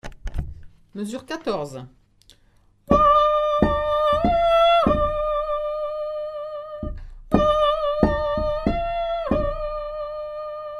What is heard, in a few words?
soprano, chorale; lamentations